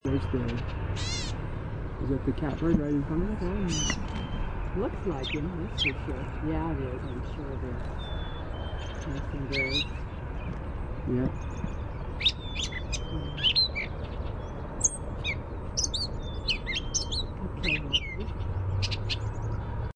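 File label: Gray Catbird mew song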